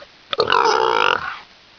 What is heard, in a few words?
houndeye idle; svencoop